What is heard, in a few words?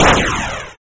Short explosion; gridtracker